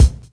rich highs kick single hit b key, Royality free kick drum tuned to the B note